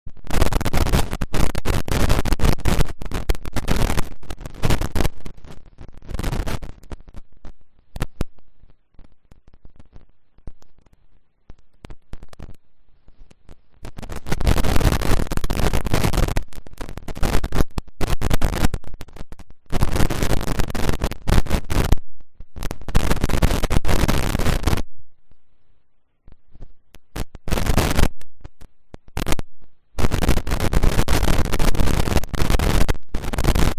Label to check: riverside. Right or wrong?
Right